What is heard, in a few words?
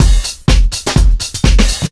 drm 125, drumloops bassworx; house; PS-28 Vocal House Samples